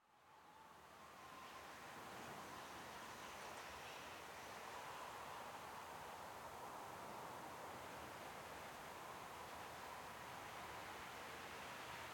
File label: Falling; Melody Hermit